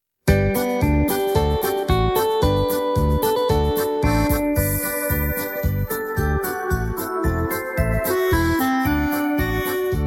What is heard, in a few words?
instrumental